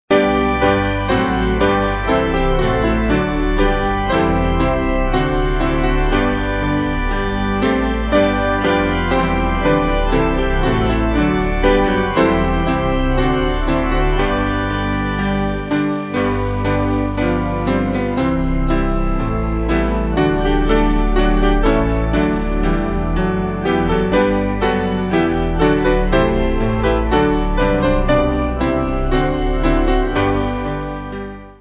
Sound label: Piano Organ